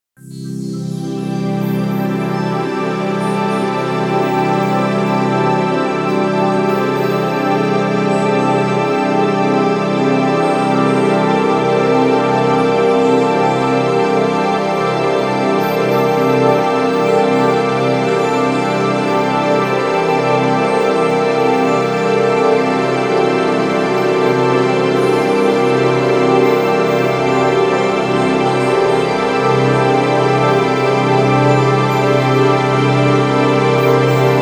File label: Bed Sweeper Twinkle Chimes Strings Wash; 5.1 STEREO MIX; Blastwave